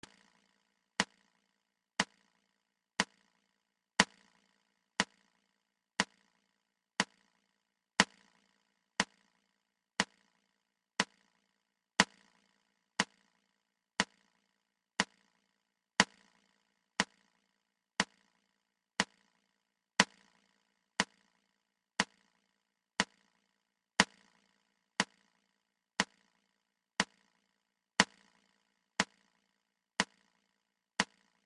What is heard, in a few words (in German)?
Metronom; klavier lernen fuer anfaenger lektion 5